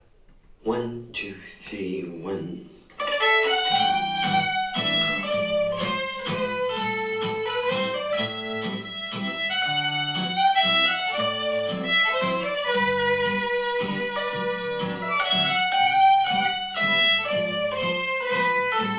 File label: Downhome Waltz; GFW Slow Session